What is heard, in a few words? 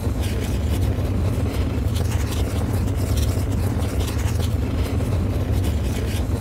Jumppack Idle; 501st-Aux-Mod-Rangers-Hole; RD501 Jumppack